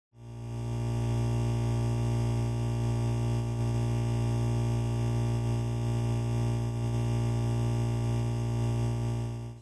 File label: hum